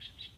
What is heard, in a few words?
Amb Hong-Kong Bird